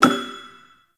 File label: Five Fates Result Four Kind; Cetus Vendors